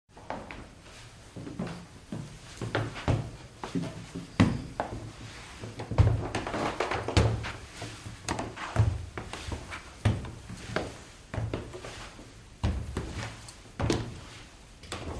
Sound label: chartwell creaking staircase